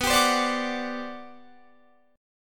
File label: G Major 11th B